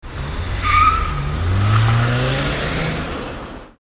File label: se car; maoudamashii